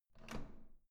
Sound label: door handle up